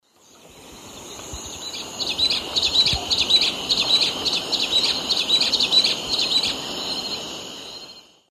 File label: Cissopis leverianus, song; S. America; Eastern Lowlands